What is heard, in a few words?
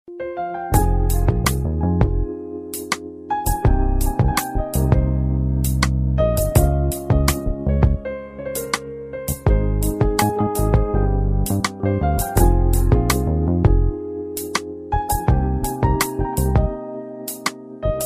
Romantic Mobile Ringtone